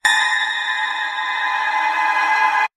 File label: Scary Reverse Ping